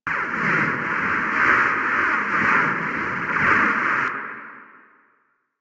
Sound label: Wall Latch Loop; Warframe; Tenno